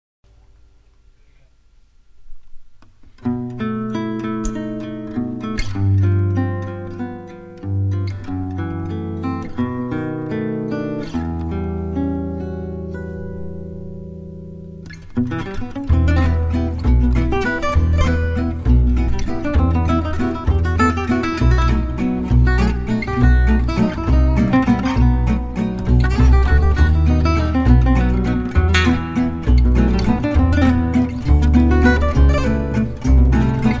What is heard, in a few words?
Musette Guitars